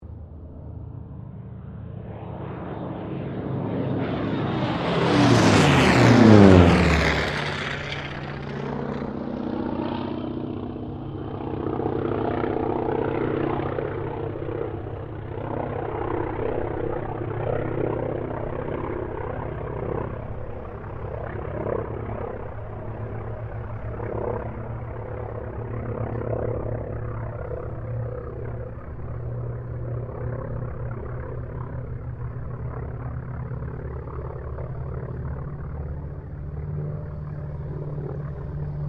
AIRCRAFT TROJAN MUSTANG PASS; Mixed aircraft squadrons; Airplanes; Vehicles

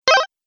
Electronic Double Ding; multimedia; dong

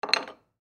China Cup Set Down On Table; Set Downs; Objects; Blastwave; Foley

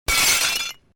Window Small Smash, glass pane smashes; A series of glass pane smashes created using FMOD; Household

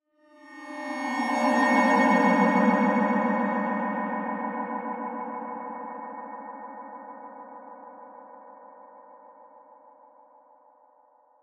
Ghost Bend, Horror; Blastwave

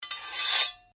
Blade Scrape Flick, Sword

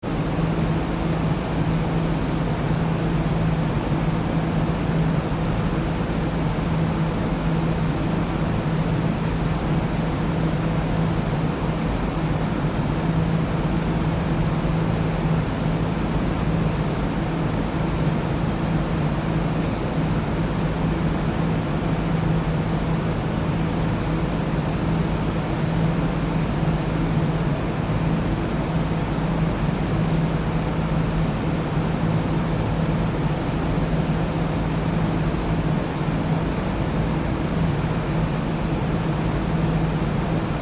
Fan Large Parking Garage Vent Close; Machinery; Machines; Big Room Sound

